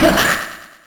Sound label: damage; jevil